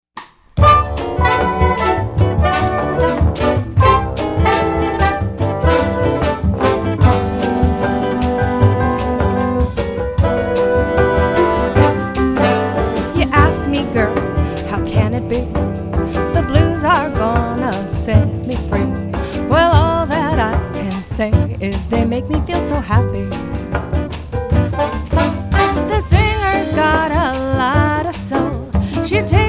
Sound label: Happy Blues